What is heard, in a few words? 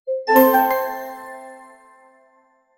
magical opening, adventurous